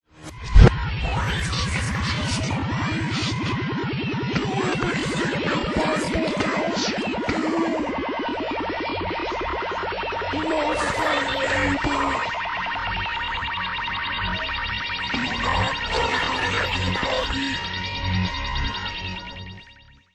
reverse, hip hop; backmasking contrario

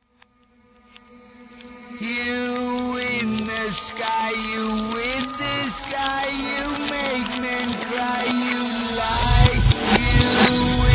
reverse; backmasking contrario

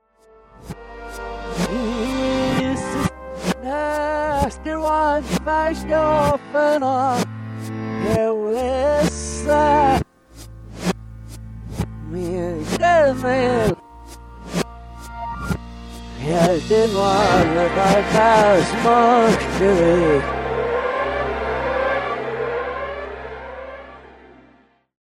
reverse; backmasking contrario

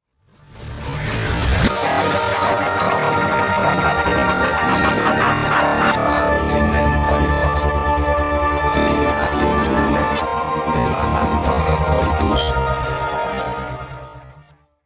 reverse, industrial

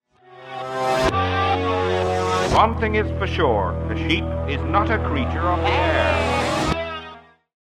reverse; backmasking contrario

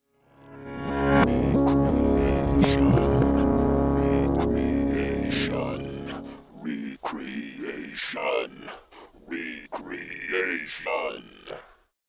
uncreation reverse; backmasking contrario

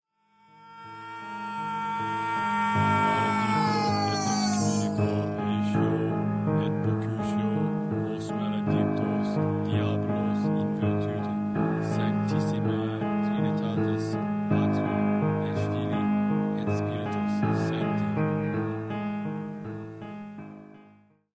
reverse; backmasking contrario

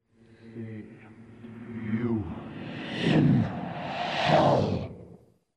scream reverse; backmasking contrario

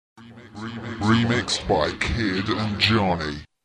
reverse; backmasking contrario

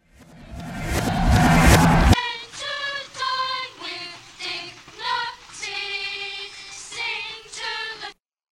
reverse; backmasking contrario

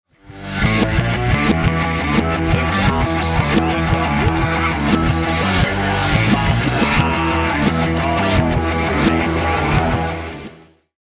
reverse; backmasking contrario

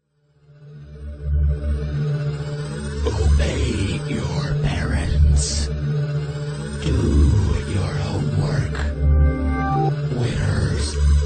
reverse; backmasking contrario

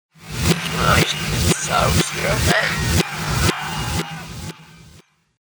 reverse, industrial metal; backmasking contrario

